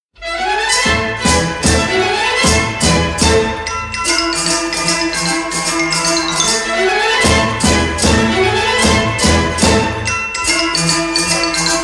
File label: China Tarantella; MONDO CANE